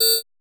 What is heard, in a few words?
synth K4, dance synth; Sound & Vision - Gigapack I CD 2 (Roland)